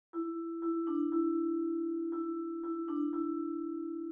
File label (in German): Rhythmus Ubungen; Notenlesen lernen